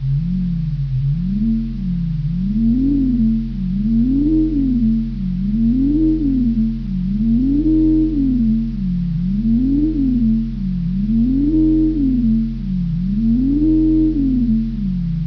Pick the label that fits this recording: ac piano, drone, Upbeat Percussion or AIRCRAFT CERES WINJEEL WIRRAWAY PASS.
drone